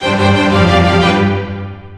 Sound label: round clear; nst zombie